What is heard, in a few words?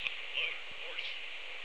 load horse